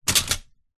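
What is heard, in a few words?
leisure toy drop into toy box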